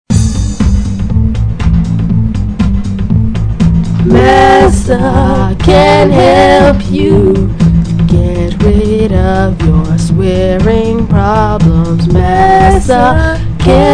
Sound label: Singalong